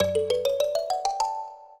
minuet, mbira; Mozart

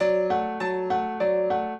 piano; Mozart

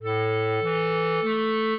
clarinet; Mozart

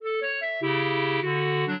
minuet, clarinet; Mozart

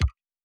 click

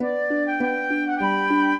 minuet, flute harp; Mozart

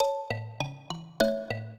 minuet, mbira; Mozart

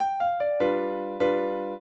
trio, piano; Mozart

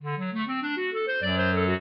trio, clarinet; Mozart

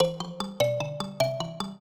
trio, mbira; Mozart

